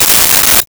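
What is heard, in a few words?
Ratchet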